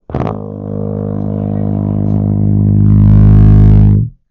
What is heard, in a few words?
build to flange, tuba